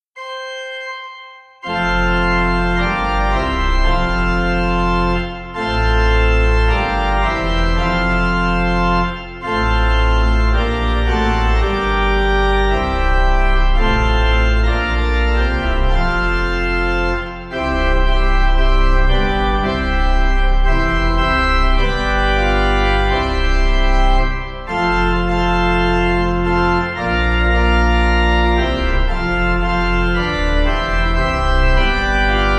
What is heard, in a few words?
Sanctus, Pipe; Communion